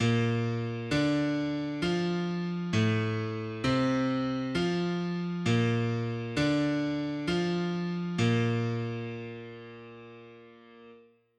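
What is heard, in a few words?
sing midi b flat; B♭ Major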